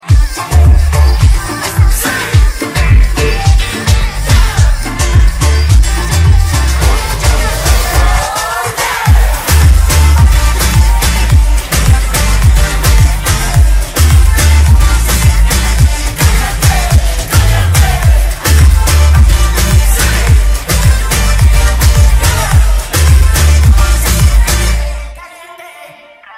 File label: instrumental